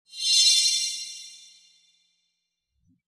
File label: prestige